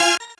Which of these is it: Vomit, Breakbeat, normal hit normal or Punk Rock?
normal hit normal